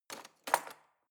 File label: knife wood drawer handle loud